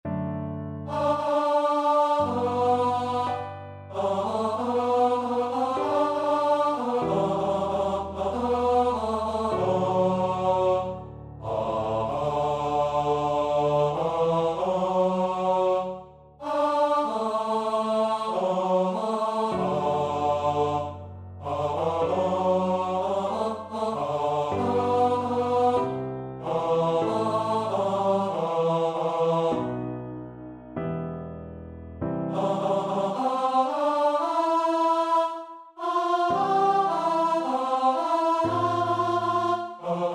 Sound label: st matthew passion, choir; bach